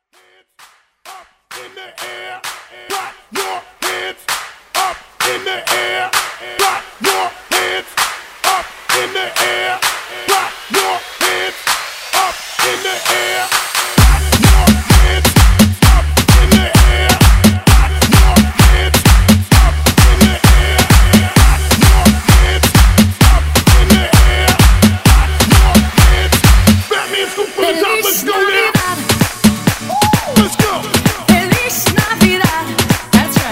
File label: Clap A Pella Intro Edit